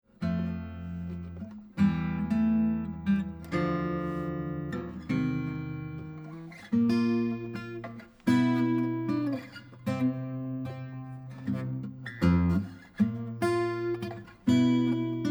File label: 12 str, guitars